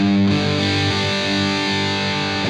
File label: Arpeggi, Heavy Combo, Guitars; ACID MUSIC